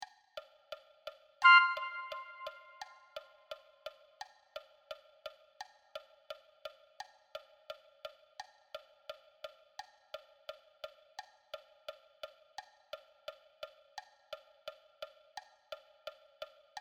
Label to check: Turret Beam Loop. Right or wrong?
Wrong